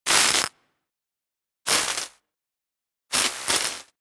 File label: spark